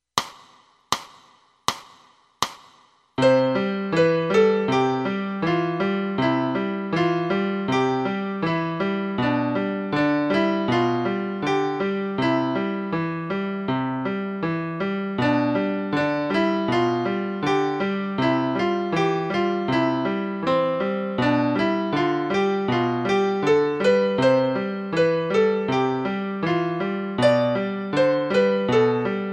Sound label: piano